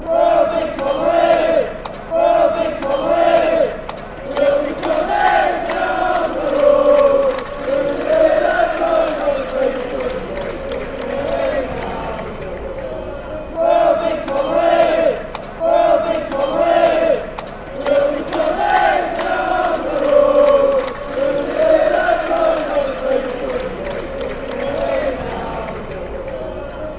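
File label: fanchants, football chants; down road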